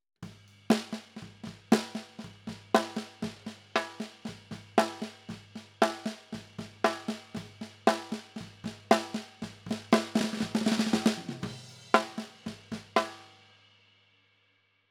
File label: Snare Bottom